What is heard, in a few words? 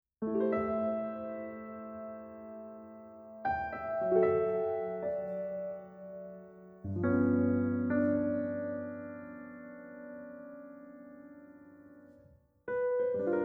Smooth And Jazzy Piano Chords